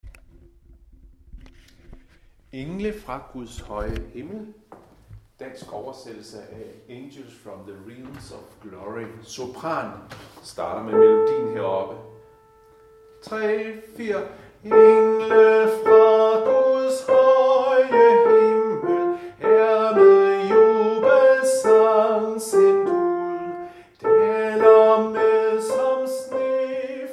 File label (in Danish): Engle fra Guds høje himmel sopran; harlev koret